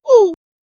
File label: player taking damage